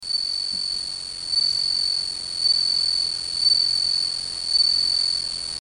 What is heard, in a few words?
bug; nakaniwa; keisoku